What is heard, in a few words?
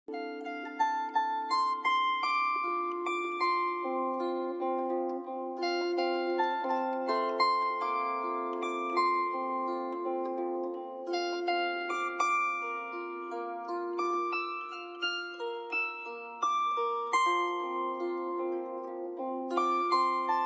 bandura